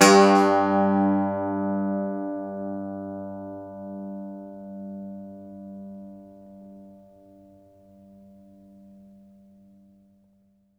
IRISH BAZ, Plucked Strings, Irish Bazouki; E-MU Producer Series Vol. 7 – Old World Instruments

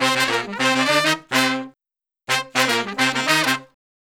Horn Section

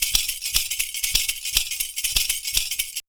PERCU; Univers Sons; Raricussions

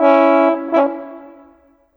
Horns, Orchestral, Rock Pop; Sonic Foundry (Sony Creative Software); Orchestral Series VOL-4; Rock and Pop; Rock-Pop 19

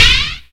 Zap; Mo' Phatt; Digital Sound Factory Mo' Phatt

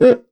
cuica, WORLD PERCUSSION; MIXED PERCUSSION; Best Service ProSamples vol.52; World Instruments 2

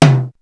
DANCE SET, DRUMS

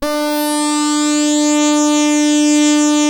SAW RES WET, POLYRES SAW2, Synth; InVision Interactive; Keith Emerson; The Most Dangerous Synth and Organ

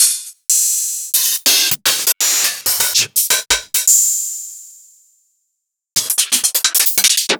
Open Hihats

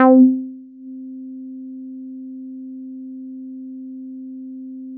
Synths BASS

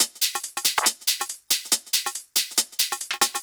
drum Loop 140BPM Perc

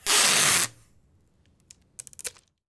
SEL TAPE, STATIONERY; AKAI S6000 CD-ROM - Volume 6; Tools

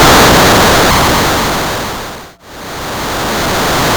Cwejman S1; DevSnd Cwejman S1 - JK

